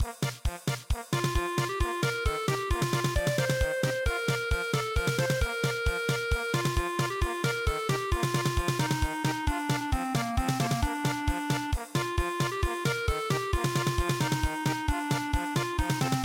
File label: polka